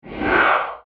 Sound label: Whoosh Fast Wipe; Whoosh Sound Effects; SoundEffects-BlastWave